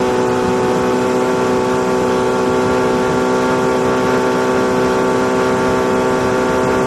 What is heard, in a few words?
150 hp Mercury Boat Drive Loop High Speed, On Board